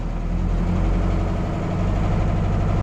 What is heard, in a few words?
boat rev up